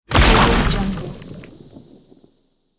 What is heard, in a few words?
bloater zombie death explosion